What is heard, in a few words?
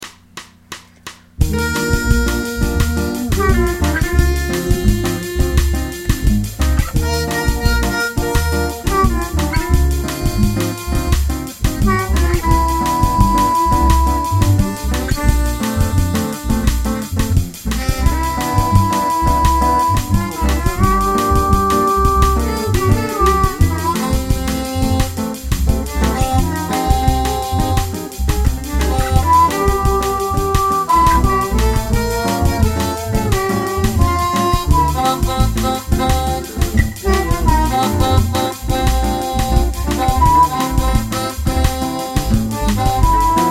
something Bossa